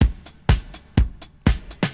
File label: LOP, Drum Loops; Big Fish Audio; Prosonus 500 Drum Loops